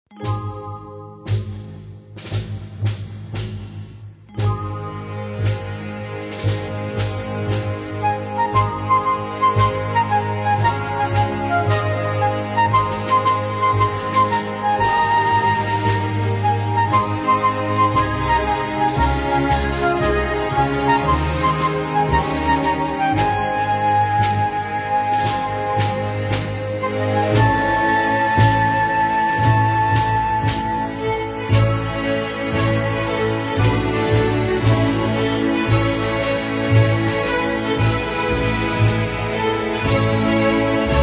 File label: Orchestra